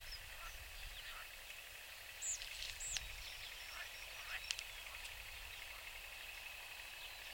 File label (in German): Baumpieper Rufe